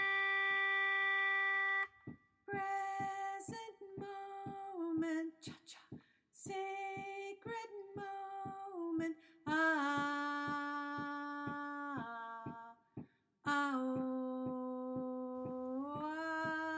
tenors; The Freedom Choir

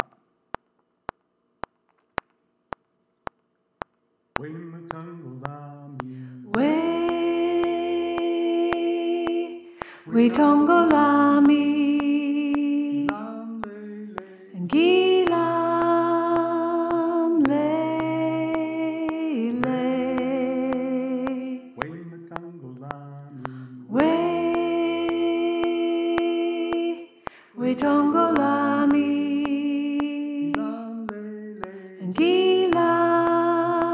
alto; The Freedom Choir

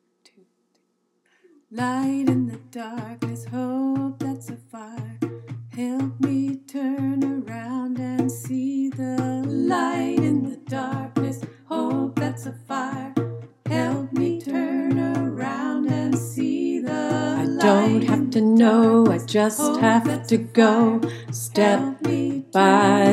Tenor; freedom choir

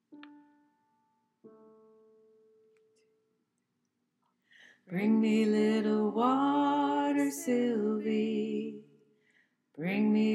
tenor, choir

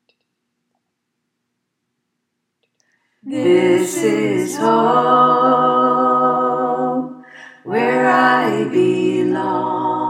tenor, choir

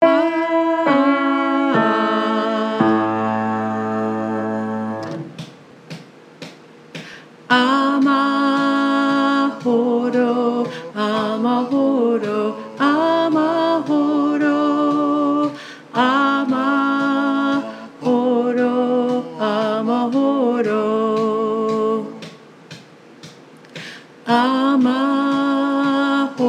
Alto

